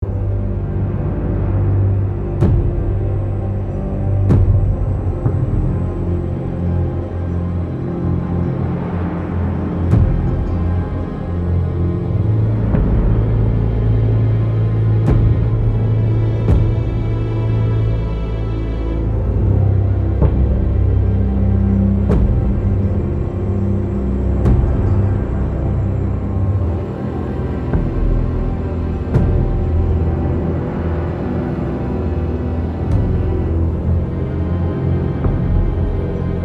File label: Suspense Loop; The Walking Dead: The Telltale Series